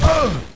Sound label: explosion